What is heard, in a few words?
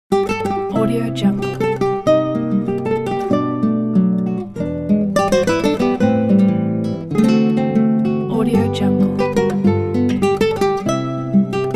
mediterranean bossa